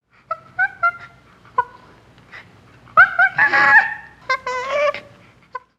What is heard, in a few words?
Jungle Primates; gnarled forest